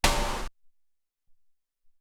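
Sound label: Hop Snare, Alesis SR-18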